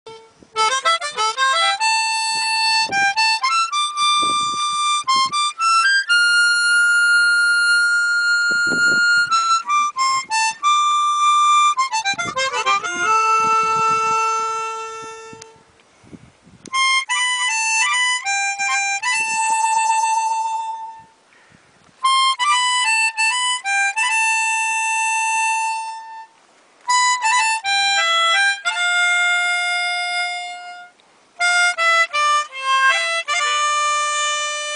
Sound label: Harmonica